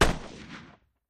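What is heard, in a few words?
M60 Various Length Bursts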